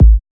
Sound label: solid bass drum a key; kick drum samples